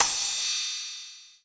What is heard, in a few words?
M-Audio Venom Splash Cymbal